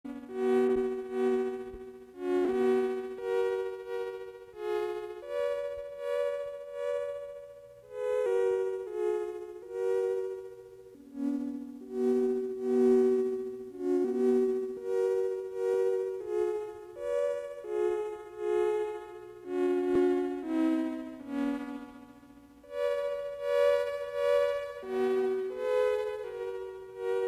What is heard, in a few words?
Xmas Songs on Buchla